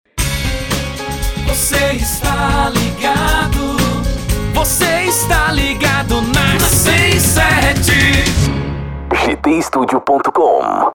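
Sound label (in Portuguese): vinheta versão cantada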